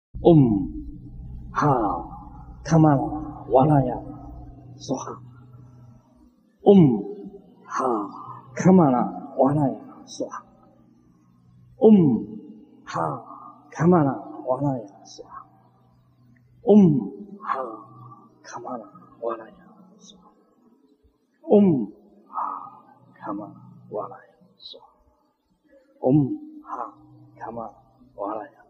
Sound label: kalachakra low noise; Teachings of Grandmaster Sheng-yen Lu